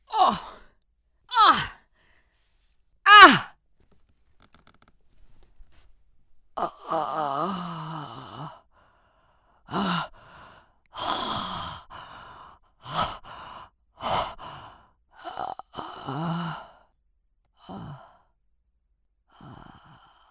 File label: Vocal Sounds Hit Dying Crying